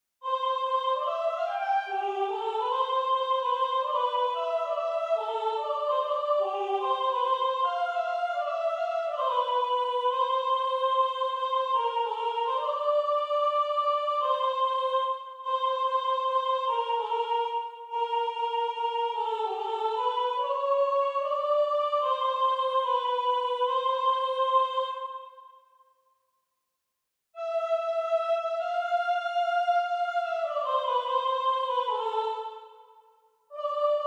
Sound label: Gloria Soprano; Domine Fili; Vivaldi; Antonio Vivaldi